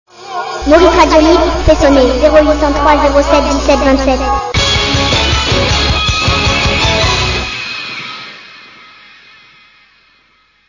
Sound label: jingle